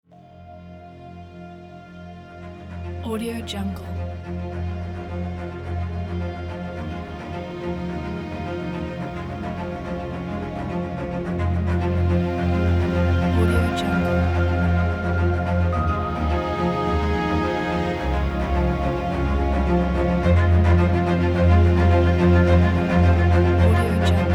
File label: modern dramatic action strings